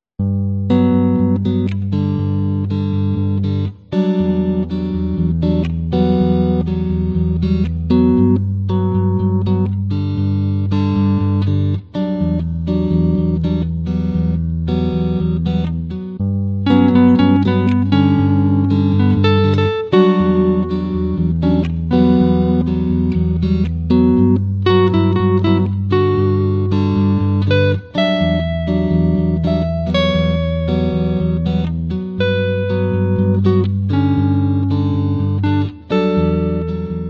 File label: Songs For Guitar